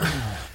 moan

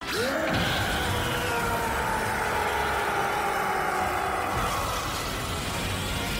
rooooooaaaar; goku

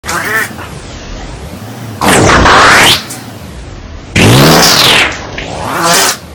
fart

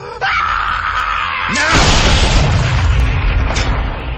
bang

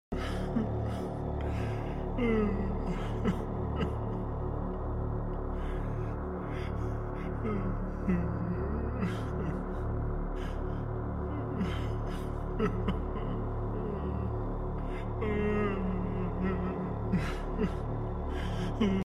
crying; scpsl 096

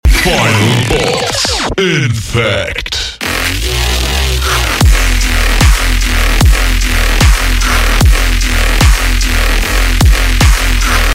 remix

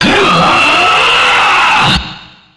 uuuuuughhhh; vergil

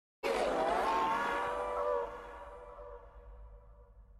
impostor scream

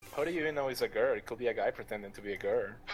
gurrrr

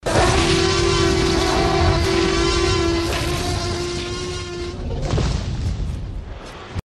t-rex roar

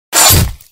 game over; granny

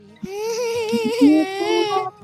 neho cry

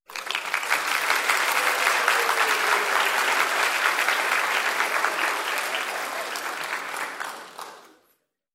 audience clapping

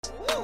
"wooh"

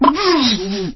death; lego star wars; 4-lom

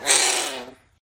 growl; gumi

